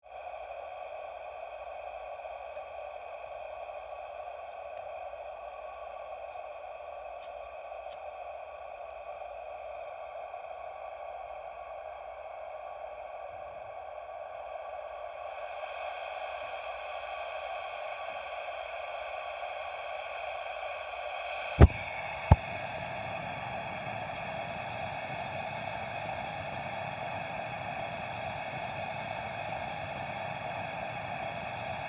Lantern Propane, Ignite, Sequence, On, Run, Off, Camping; Sports; Miscellaneous Sport Sound Effects; BlastWave